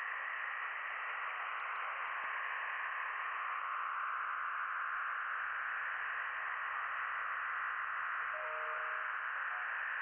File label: from radio, 7177KHz